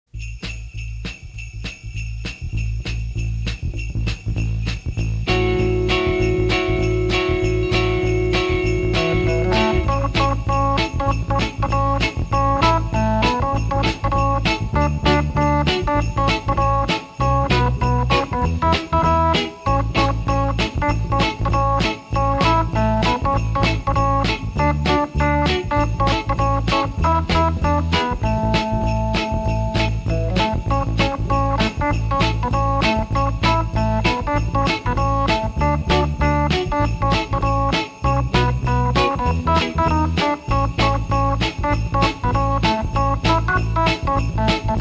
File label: Jingle Bells